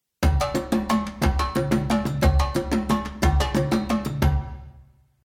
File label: Percussion Ensemble